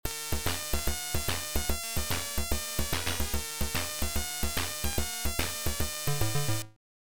Title AY Beeper; The Arc of Yesod

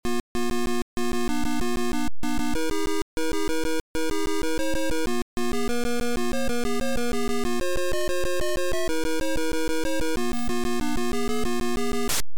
Title, Beeper; Crystal Castles

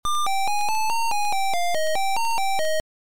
Start Game, Beeper; Cookie

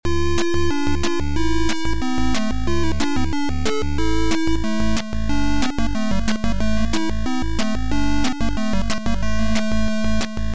RUS POPS; Ironman

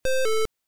Start, Beeper; Hunchback

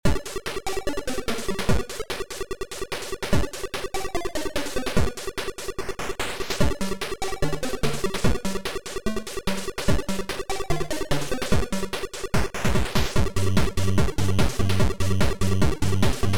DISKO

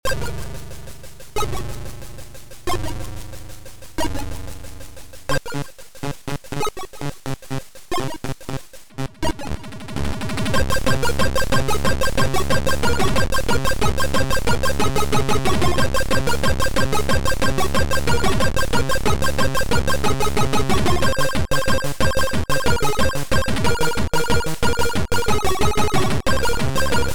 TECHNO; Eastman

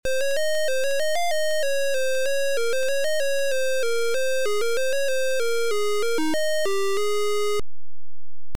Title Beeper; Martianoids

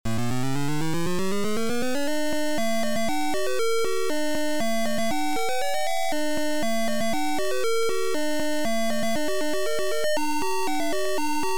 Title Beeper; Solomon's Key

